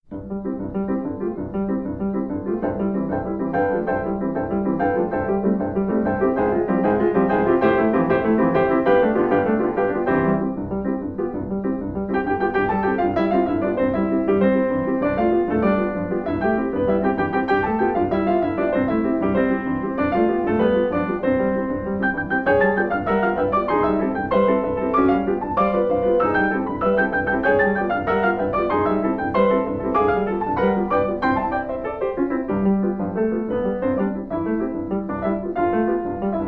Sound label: Jamaican Rumba